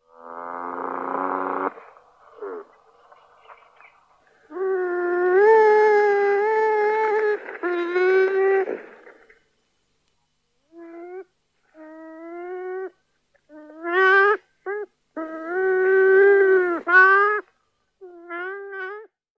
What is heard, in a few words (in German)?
Nutria Sumpfbiber Myocastor coypus; In einigen Bundesländern, darunter Bayern, ist er dem Jagdrecht unterstellt und darf ganzjährig erlegt werden.